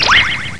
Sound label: PYON; SKULD